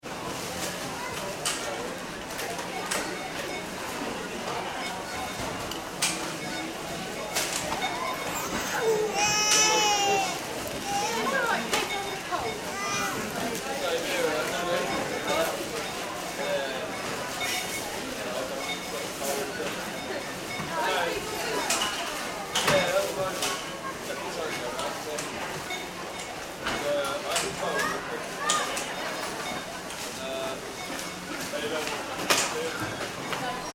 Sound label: ambience store supermarket; Supermarket Internal Ambience - People Walking And Talking Close - By And Distant Walla; Stores and Malls; McKinney Sound